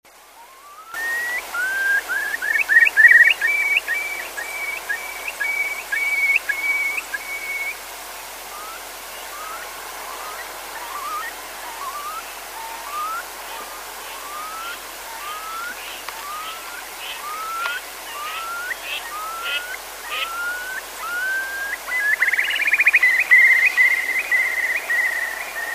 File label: Numenius arquata